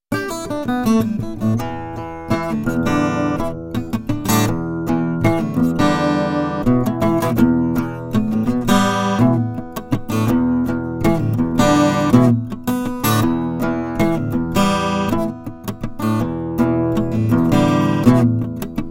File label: Sennheiser e914 12string